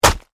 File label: Splat Crunch, Heavy; Impact; Blood and Guts (Sound Effects:Horror); BlastWave